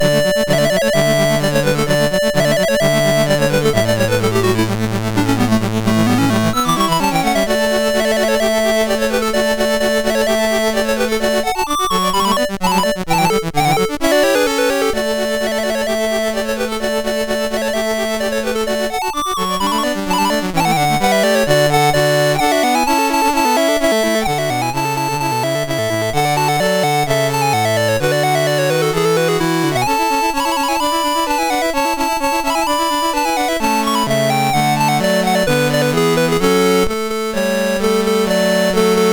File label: Tambourin, MOS6581R4; Dave Marquis